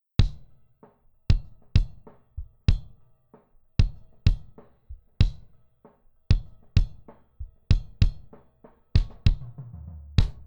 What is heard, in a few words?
Kick With Debleed Long Tail Kick